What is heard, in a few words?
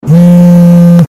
iphone